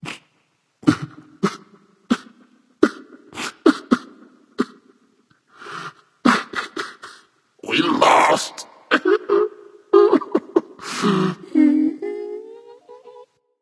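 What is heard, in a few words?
nonverbal boohoo; smite; voicepack; merlin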